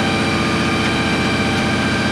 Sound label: turret move, tank